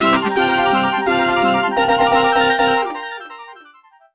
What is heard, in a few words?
Dog Show - Championship Fanfare; Nintendogs Chihuahua & Friends